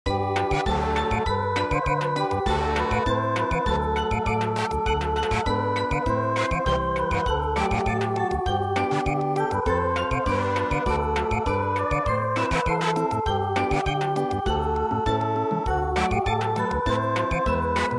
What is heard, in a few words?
Samba; Hearts ease